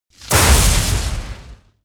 Beamgun Plasmagun; Weapon

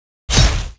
Metal elf Warlord Attack

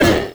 Skouter damage

